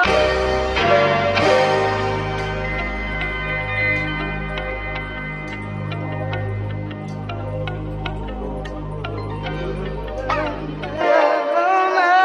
keys guitar voice; loops; Phonky

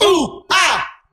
"ooh ahh" male

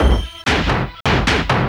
distorto, Drum loops, Electro

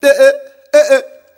"deh eh ay eh", ooh ahh

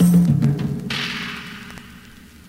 Drum loops; Just Add Drums

